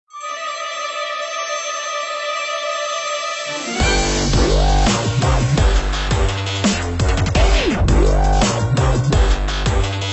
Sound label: Dubstep Ring